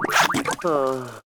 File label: Someone Takes A Quick Poop; Ed Edd n Eddy - Adromulus Pack; EEnE